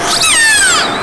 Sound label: orca; K33a SEK